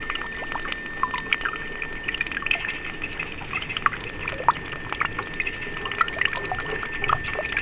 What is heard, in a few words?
harbour